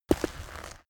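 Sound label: step gritty stone step, footsteps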